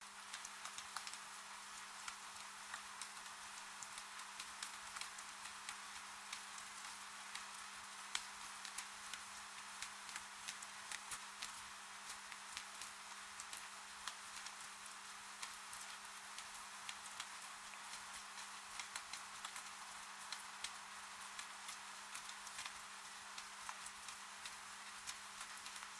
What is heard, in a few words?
relaxing rain